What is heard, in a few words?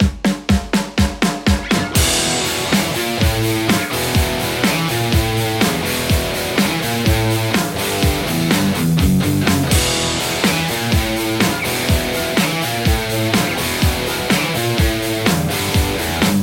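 Telecaster; igniteamps emissary v1 00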